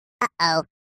zvuk oou

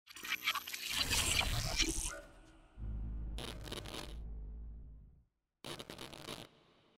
gologramma s pomehami

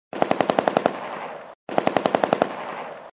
avtomat streliaet vdaleke

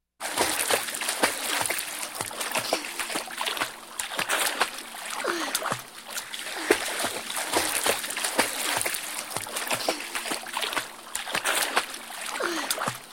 zvuk rebenka barahtaushegosya v vode

